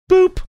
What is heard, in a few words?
boop